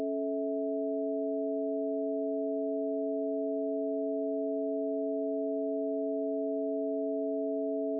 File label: gsm